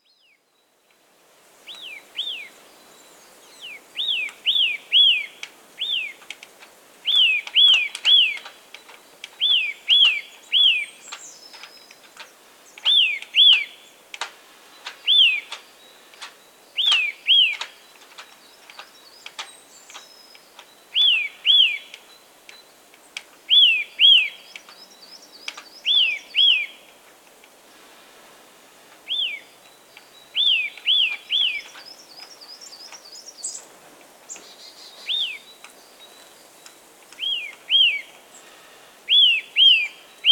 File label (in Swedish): nötväcka; fågelljud